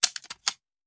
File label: Machine Gun Foley; Sound Ideas; Guns